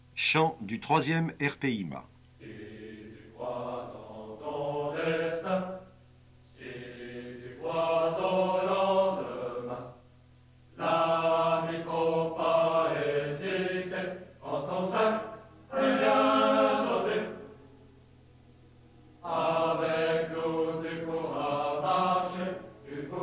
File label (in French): Chant; Troupes de marine